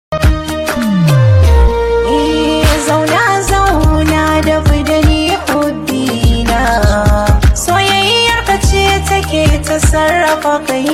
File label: Hausa Soundtrack